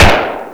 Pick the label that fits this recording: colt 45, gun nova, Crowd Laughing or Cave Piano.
colt 45